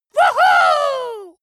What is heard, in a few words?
dwarf cheer "wuhu"